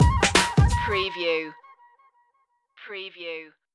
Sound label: dopey dilla stinger